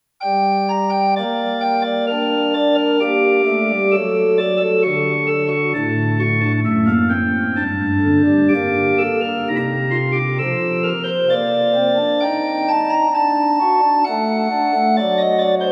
invention

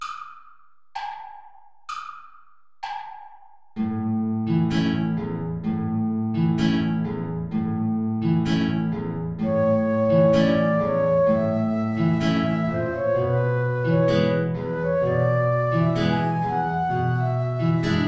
tango play along without flute; albeniz isaac

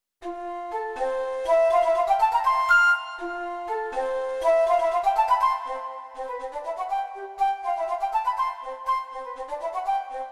intermezzi animato

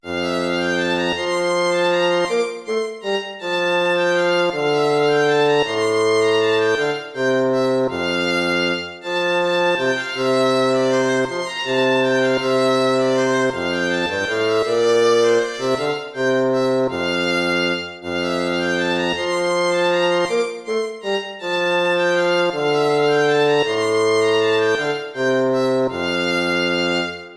piece for lute

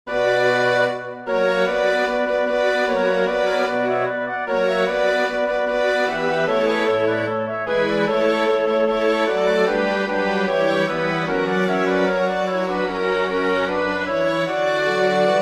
chorus for winds strings; bach johann sebastian; was was rfen wir weiter zeugnis

